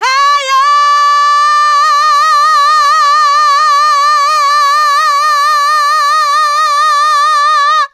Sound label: voc, female vox, Vocal House